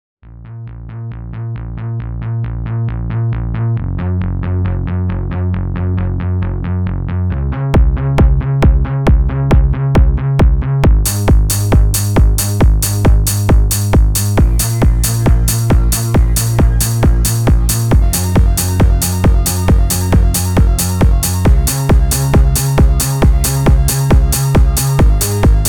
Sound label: dance trance